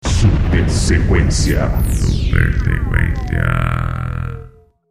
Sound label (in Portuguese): super sequencia